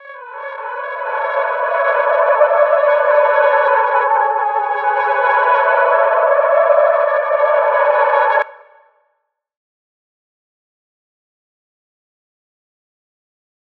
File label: 114 BPM F# Min Reverse Lead; Trap Melody Loops; Loop Stems; Cymatics; Nightmares; Fireflies